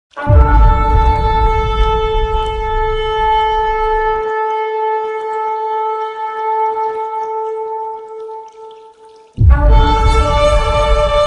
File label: SHOFAR